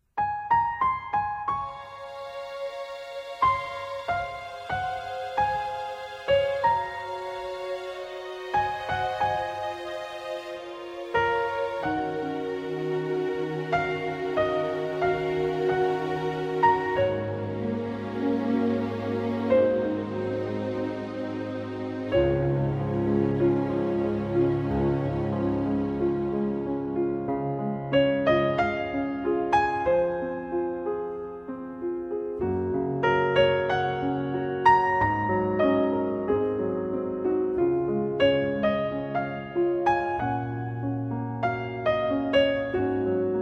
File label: music, piano